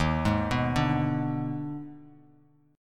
D sharp Major 7th Suspended 4th Sharp 5th D sharp Bass Standard, chord; arpeggiated; 11,11,9,7; JGuitar